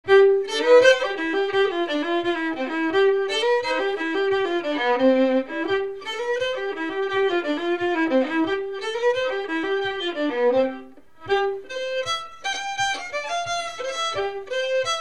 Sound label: steklat; folklivsarkivet